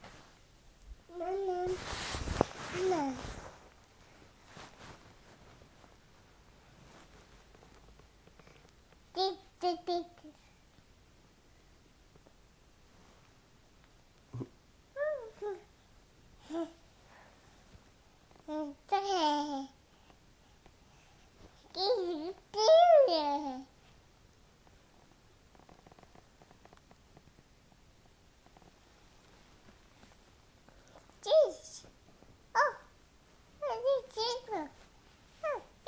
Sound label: babbling